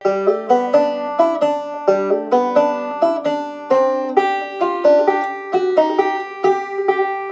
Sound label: Rag syncopation and chromatic bar shape, banjo